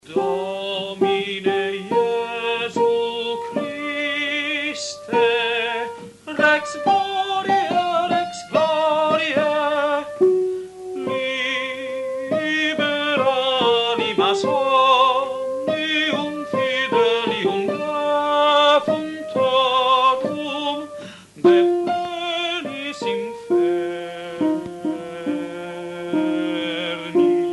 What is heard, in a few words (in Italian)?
Requiem Domine Jesu soprani; Mozart